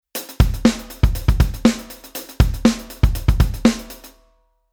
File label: Rhythm 16 beat Basic